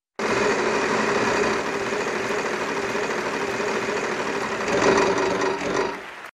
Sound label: wheel of fortune wheel sound